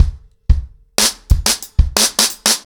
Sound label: 90BPM, acoustic drums, drumloops